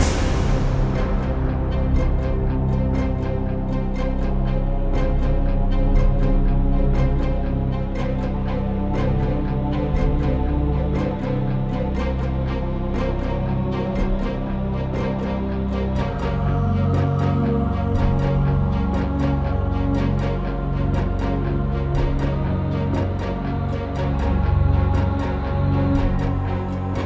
music interface (loop); PC Gamer IT CD 32; MECHCMDR

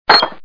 drop; How Many Bugs in a Box

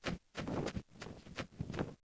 run; poolofradiance

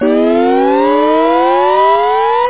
CHARGE; gonyshoot

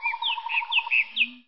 farm; PHARAOH

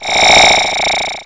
buzz; DRUM SOUNDS

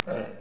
horse question

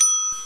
Short Desk Bell

